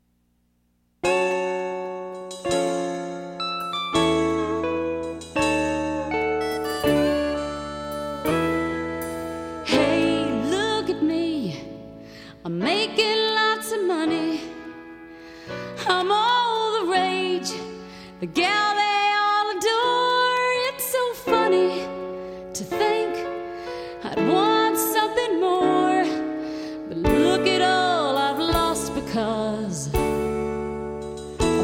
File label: THUNDEROUS APPLAUSE